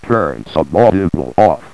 Sub Audible

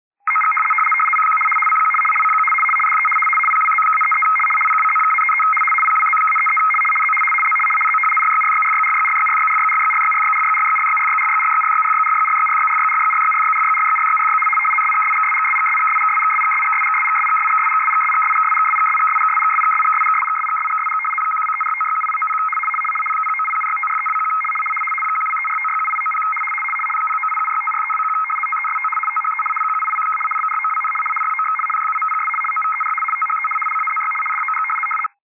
test tape RTTY 850 75R; 6449; PWZ-33

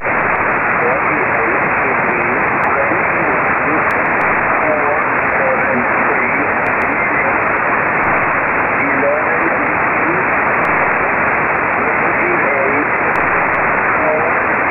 moon 23cm

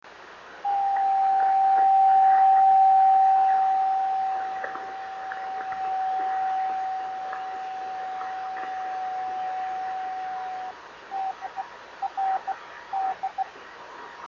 26870KHz

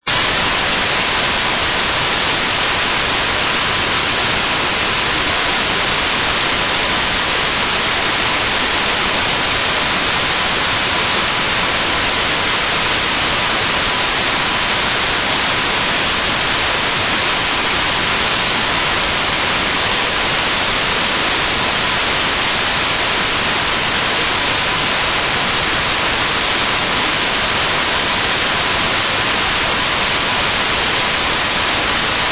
psk noise

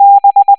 b tone

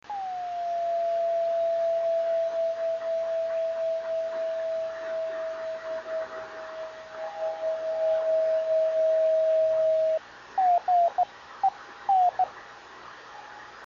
26605KHz

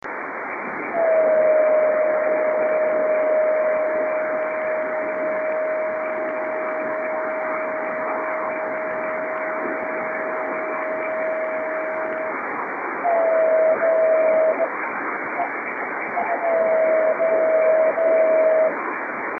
26870KHz

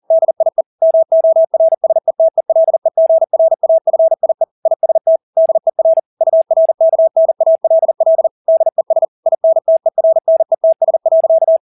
Motto 200 BpM

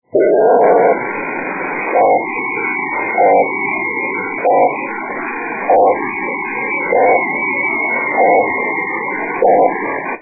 pactor

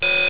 screach

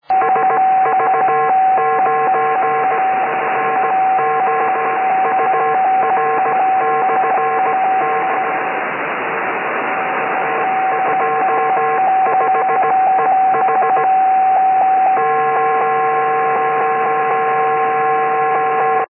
beacon

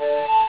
low hi digi

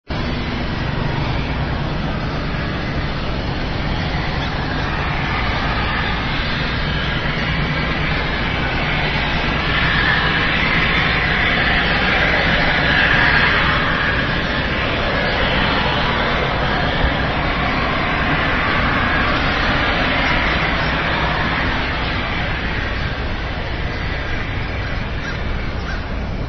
locomotive

